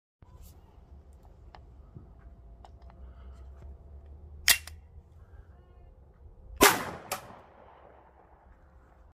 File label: Glock, تفنگ